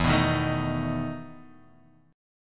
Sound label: E Major 7th Suspended 4th E down